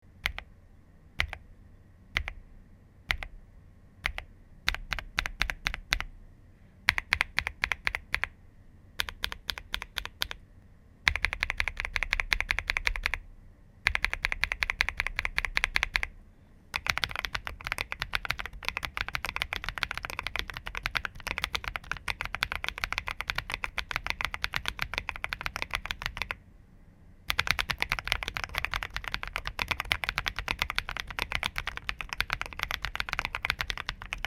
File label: GALAXY PINK SWITCH SOUND